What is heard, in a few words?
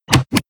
Pellet Machine Button; moul